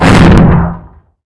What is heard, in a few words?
object collision